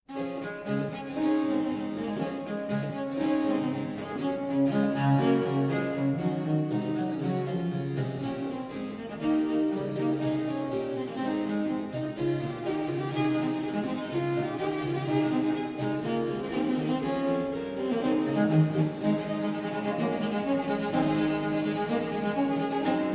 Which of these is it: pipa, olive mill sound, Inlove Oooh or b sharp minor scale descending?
pipa